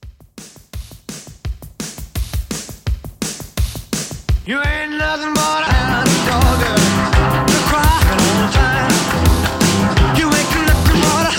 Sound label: 50s Rock N Roll Re Drum 169